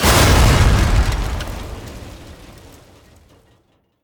Enemy Swat Helicopter Crash; Rampage

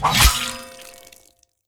Attack; Hop Lite; Legend of Keepers (gamerip) (2019)

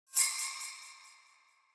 Hi Hat; Scared standart

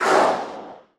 Finalboss Vox Damage

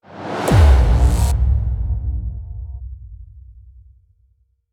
Powerup Appears

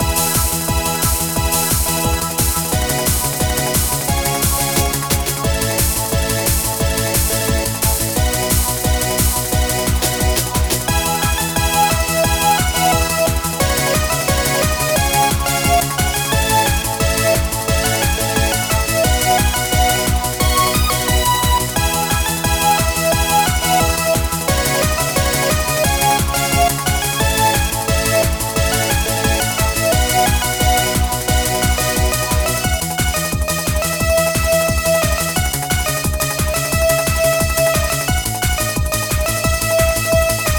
Disco; Minskies Furballs; Amiga

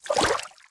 Swimming Splash; MiniGames

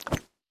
Equipment Equip Item

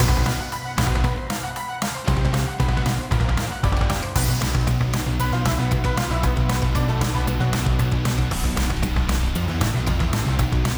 ogre boss; batbarian testament of the primordials

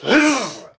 Attack Long

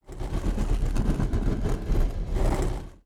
deity intro spin; enemies

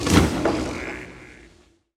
Rune Apply

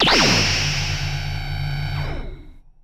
Large Sustained Beam Evil; battle suit aces

